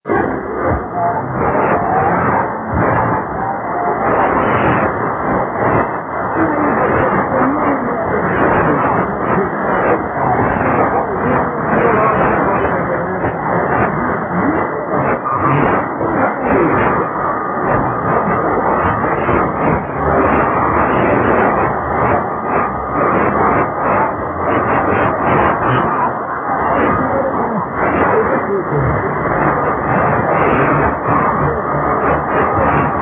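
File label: radio, 5980 kHz; R Guaruj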